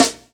SD FUNK, SNARES; E-Lab; X Static Goldmine 2; akai

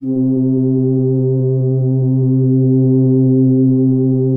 Atmo Pads; Best Service; XX Large

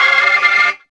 ORG CHD

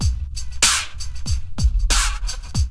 LOOP; E-Lab; X Static Goldmine 2

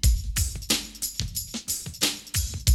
BREAK

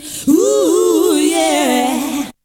VOC; ZERO-G; Datafile

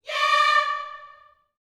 "yeah", gospel vocal, D5; Spectrasonics; Vocal Planet Vol.1 Gospel; akai

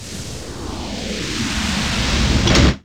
FX

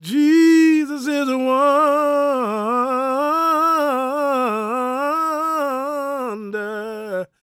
GOSP MALE, Vocal Planet Gospel

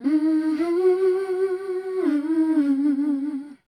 #D, Voice Spectral; MELODIC; Best Service; Voice Spectral II

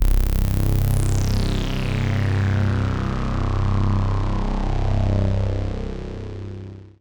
PAD, ATMO PADS; Best Service; XX Large

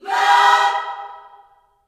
LOVE CHORD, Vocal Gospel; Spectrasonics; Vocal Planet Vol.1 Gospel; akai

